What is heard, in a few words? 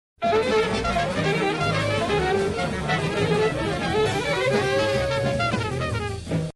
free jingle